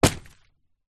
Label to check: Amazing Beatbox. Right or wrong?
Wrong